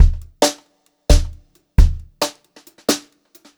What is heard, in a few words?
Brushed Drum Loops; USB Soundscan vol.39; AKAI; 133 HOTROD